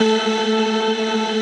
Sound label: cruel loop4pad